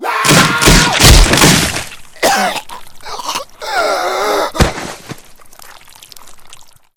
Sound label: headdesk; CosmicRage; CosmicRageSounds; socials